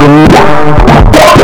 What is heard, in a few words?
VOX FX; Big Beat Samples & More